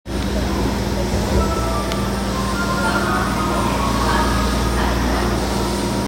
南北線市ヶ谷北, train sounds

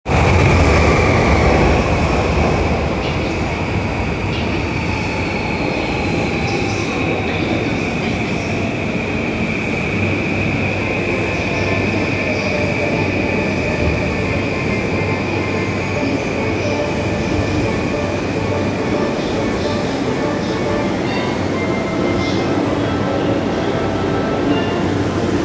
埼京線 恵比寿 南, train sounds

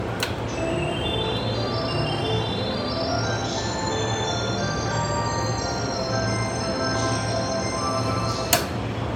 JR京浜東北線桜木町南, train sounds

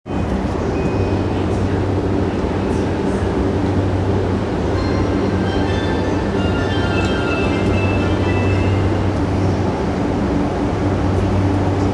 南北線四ツ谷南, train sounds

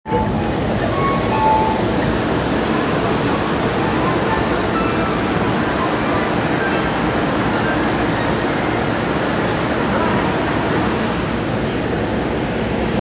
千代田線赤坂西

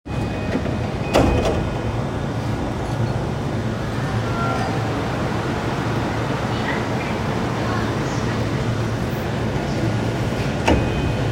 横浜線 相原 北, train sounds

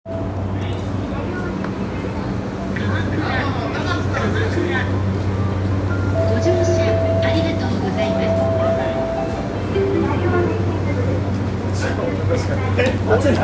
JR 横須賀線 鎌倉 北, train sounds

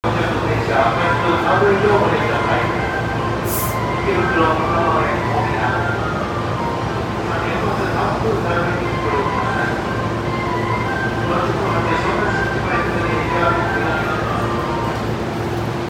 埼京線 新宿 北, train sounds

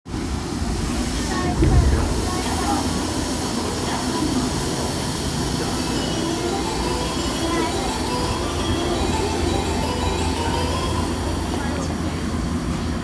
副都心線北参道南, train sounds

